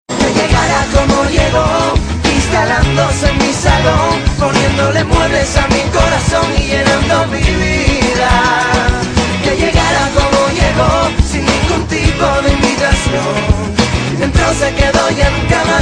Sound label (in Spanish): fondo flamenco